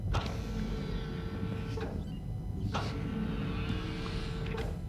Office Door; DS9; trek

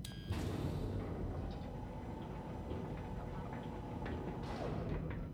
Airlock; trek

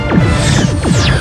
Cell Ship Weapon; trek

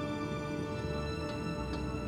Climbing Ladder; trek

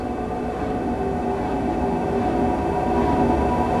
Turbolift Sounds; trek

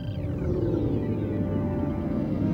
Defiant Main Power Down; trek

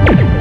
Torpedo Fire; trek

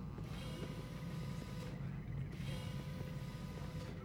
Turbolift; Trek

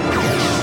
Ship Weapon; Trek

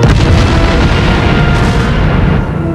Romulan Mine; Trek

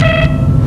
Ship To Communicator Chirp; Trek

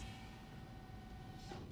Quarters Door Open; trek

